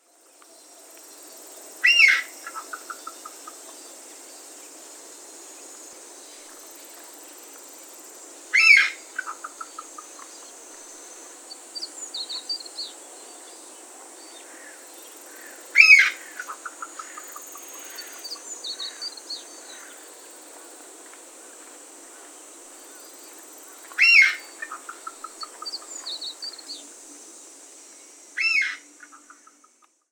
コジュケイ 地鳴き

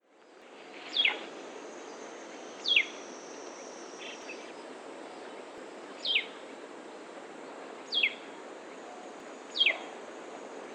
カオジロガビチョウ 地鳴き

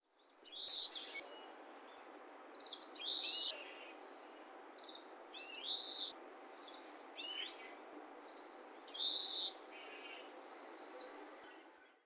kawarahiwa, saitama

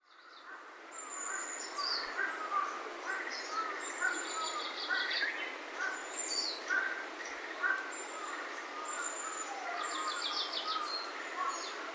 オオムシクイ さえずり; 北本市

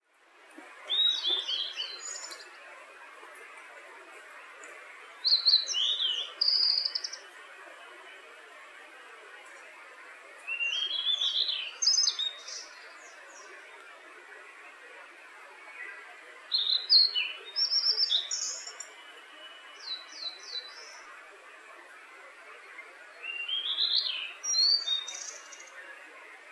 ooruri

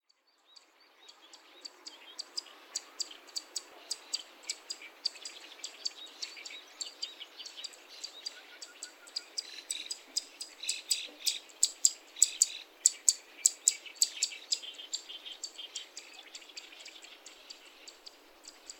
セッカ; さいたま市

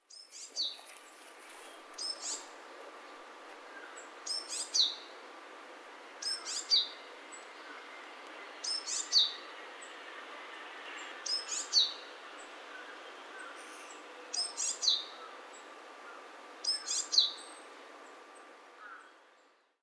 shijyukara

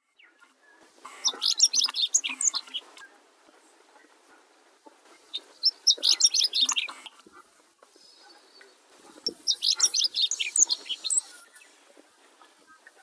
ホオアカ; 車山方

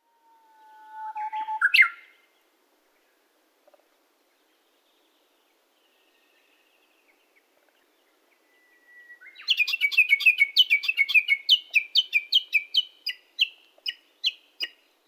uguisu

